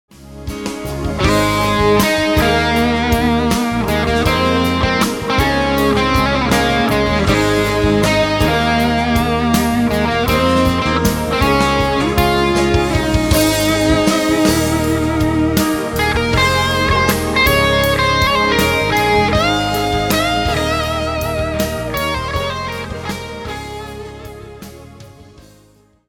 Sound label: Solo